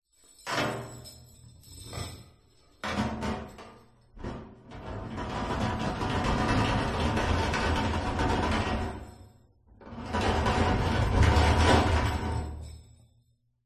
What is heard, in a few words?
prison door metal rattle open or close jingle of keys chains